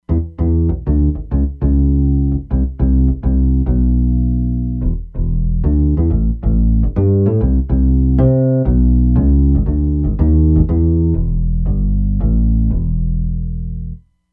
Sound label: Bass, E-mu Proteus-3; Factory patches